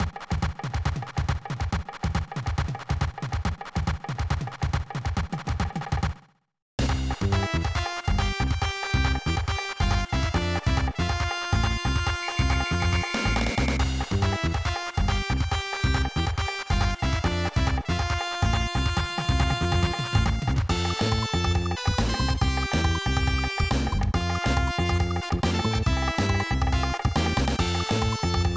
Southern Rhythm; Terranigma; SNES

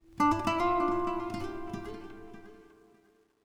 Oneshot Acoustic Guitar

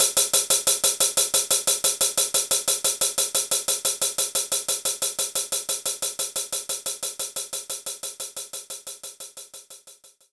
Hi Hat Closed Hard

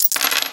Menu Use Buy Coins

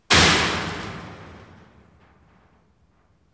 metal netting kick hit

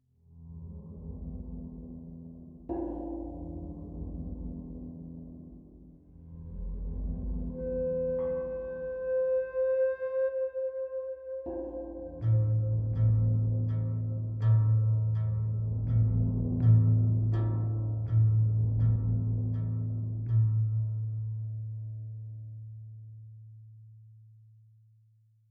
Combat Tension Ambient; The Last of Us

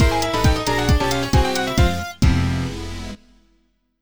Try Again (Jingle); Heaven Studio

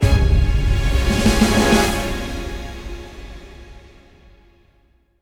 BGM Battle transition; aster tatariqus android ios mobile gamerip 2023; BGM Battle Izorde

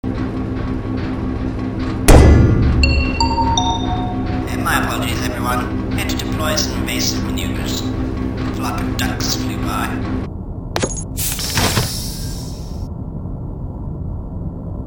Leap; Infiltrating The Airship

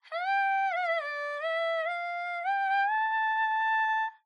Kulning; bramble the mountain king; ps4 ps5 switch windows xbox one xbox series xs

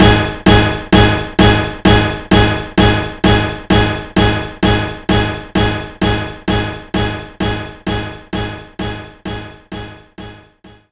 Orchestra Hit

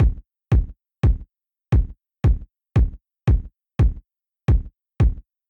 Kick

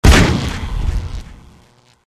large volt; Electricman 2

